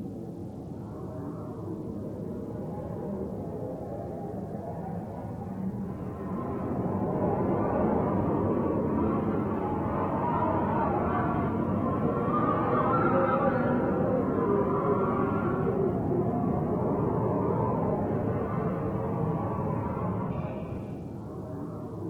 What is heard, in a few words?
town wind loop